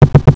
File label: THUMP